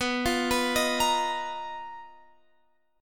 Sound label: B Major 7th Flat 5th B down Guitar Open F; arpeggiated